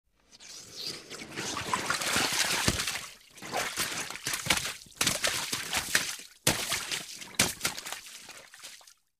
Body Fall Slide Mud

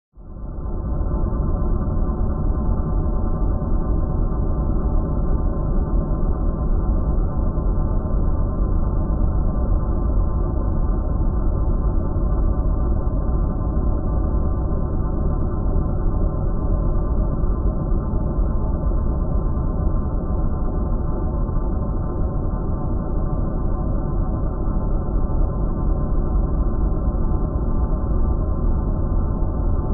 Alien Power Chamber, drones

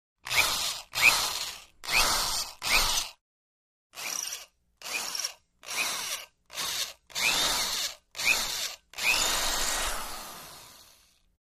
Electric Drill Wood; drills

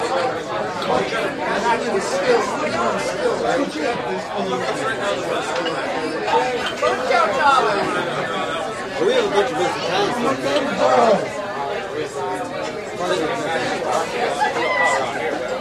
Bar Atmos Loop Sml Cro; bars & discos

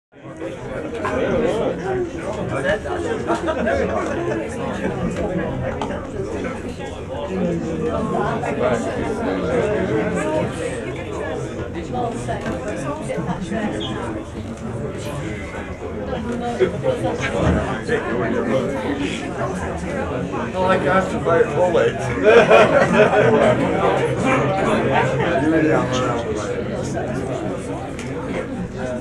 Interior Busy Crowd, chatter walla

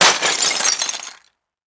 Mirror Crash; housewares

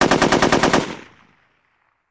Machine Gun Sngl Rap, single burst

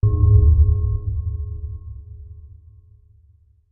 Bass Impact Tonal So; impacts

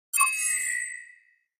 Elect Pulse HF Wash, multimedia element

